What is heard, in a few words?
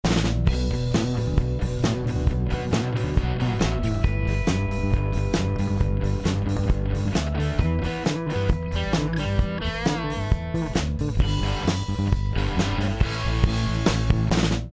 Band